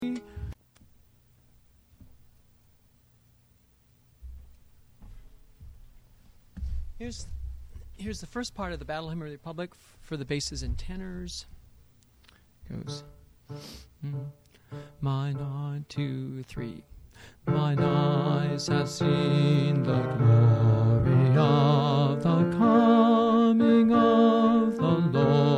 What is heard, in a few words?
Bass; Battle Hymn; District II 2003; Mixed Choir Practice